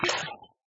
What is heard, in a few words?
bottle empty; Minecraft